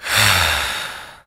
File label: "ah", relief; ooh ahh